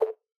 click; aviamaster; Aviamasters

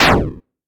trans Down; todee and todpee

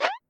Jump

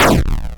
Thunder

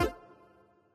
collect, bonus; aviamaster; Aviamasters

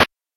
intro Giant Hand Grab; todee and todpee

